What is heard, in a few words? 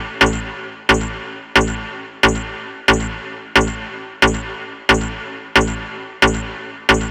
Synth Loop A 135, Techno; Ghosthack; AC2024 - Day 1 - Techno Sounds 2025